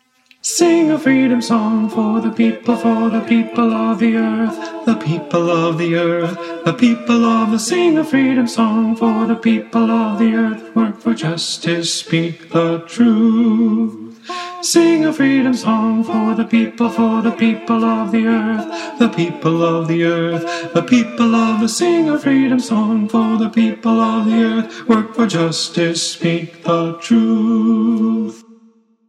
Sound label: Sing a Freedom Song Alto